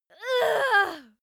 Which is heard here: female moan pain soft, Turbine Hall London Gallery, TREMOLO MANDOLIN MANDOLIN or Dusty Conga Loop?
female moan pain soft